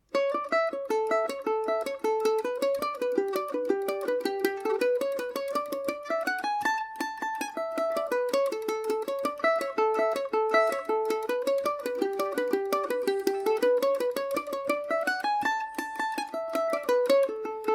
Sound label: billy barlow amaj, mandolin; Paris Swing Macaferri mandolin